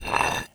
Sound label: monkey put